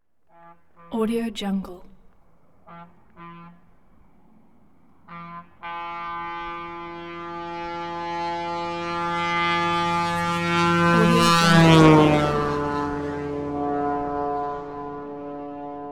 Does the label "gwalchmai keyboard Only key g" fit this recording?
No